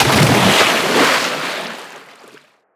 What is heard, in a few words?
Splash, Water